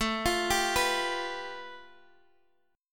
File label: A 7th Suspended 2nd Sharp 5th A